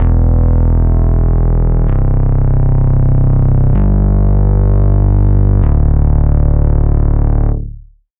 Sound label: Bass; Routed Sound; Serum 2 Expansion - Star Scavenger Vol.1